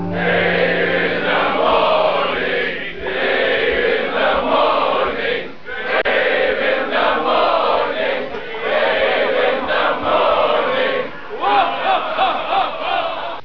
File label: Choir